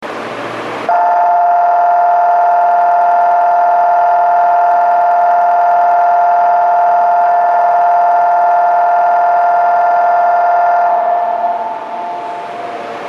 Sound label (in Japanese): nagaden bell; 発車放送のあとに流れます。全ホームで同じベルが流れます。; 特急湯田中行き; 各駅停車須坂行き